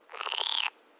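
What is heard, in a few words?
ambience China 3D ambience; rabbids multiverse; iOS, macOS, Mobile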